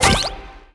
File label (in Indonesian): snowman kebentuk; MiniGames